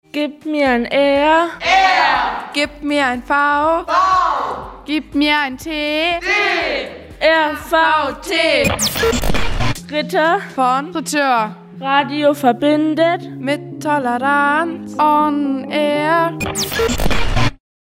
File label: Jingle